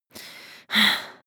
breath female intense

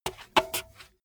bmx bike tire foot scuff sequence short

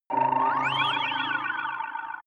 elastic spring whistle

